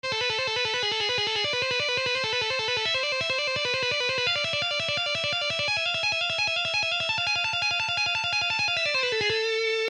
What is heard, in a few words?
Shredding Exercise